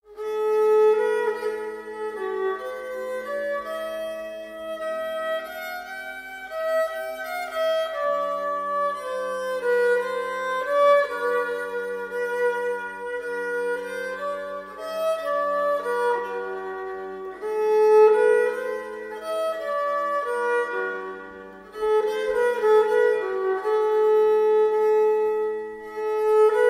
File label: vielle